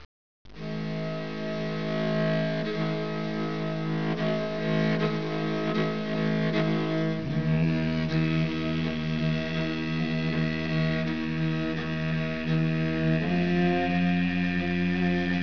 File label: throat, mongolia